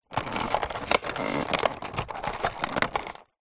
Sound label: foley plastic creaks stress pressure toy car